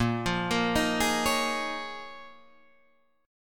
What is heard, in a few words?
A sharp Minor 11th A sharp down Guitar Open D, chord